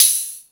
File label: High Hat, E-MU Pro-Cussion